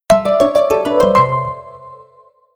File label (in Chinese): 手機鈴聲; OneWorld